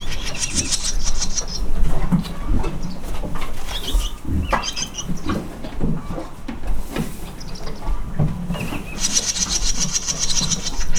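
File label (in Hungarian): disznóól eszik, partifecske; parasztudvar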